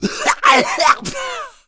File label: pain smoker; umbrella